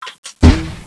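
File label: Mortar Sound; Close Combat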